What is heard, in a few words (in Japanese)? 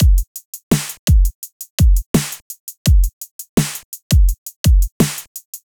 リズムパターン